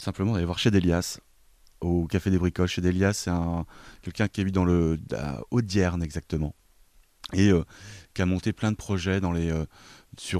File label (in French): FOLK